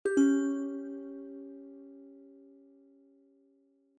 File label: wrong